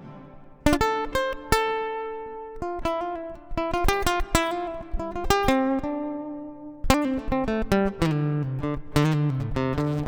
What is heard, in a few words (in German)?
Konzertgitarre CompReverb; Ibanez FRH10N